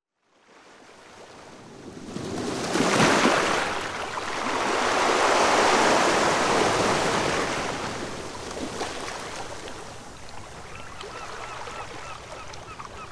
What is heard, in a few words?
Ocean Waves Coming in Light Splashing Rocks